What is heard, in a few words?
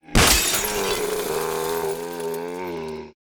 Crashhh